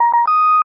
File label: price up sound; conditional price alerts